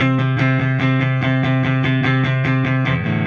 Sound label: Silos Clean Strum Guitar, Indie Rock